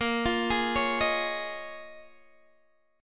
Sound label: A sharp Minor 11th A sharp down Guitar Open D, chord